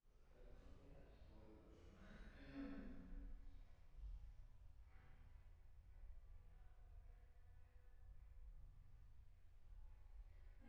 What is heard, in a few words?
Choir